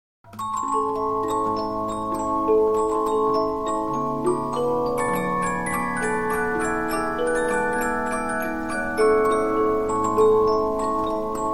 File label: Polyphon, 15inch disc musicbox